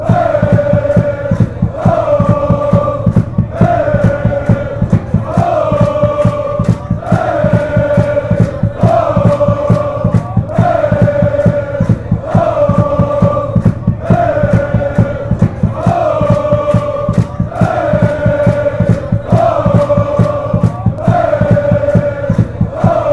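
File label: fanchants, football chants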